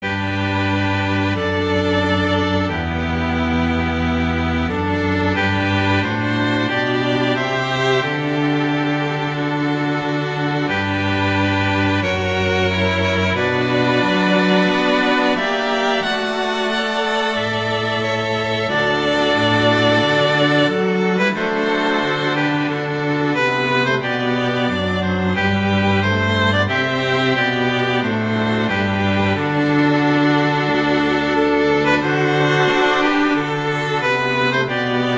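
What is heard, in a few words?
O Canada, string quartet; Lavallee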